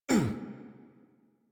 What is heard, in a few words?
Pain, Adult; Warframe; New War; Adult Operator